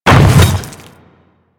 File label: Hammer Boss Land Ice, Footsteps; Warframe; Grineer